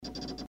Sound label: fast 5 clicks